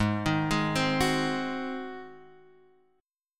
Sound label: G sharp 7th G sharp, Guitar Half Step Down